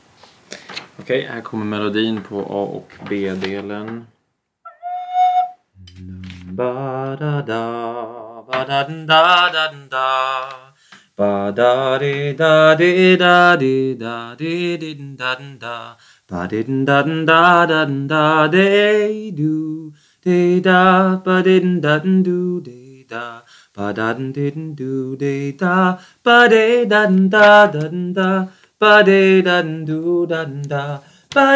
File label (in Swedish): Melodi A+B